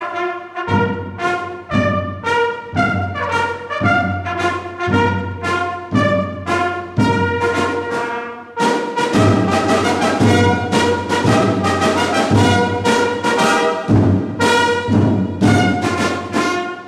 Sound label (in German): Fanfaronade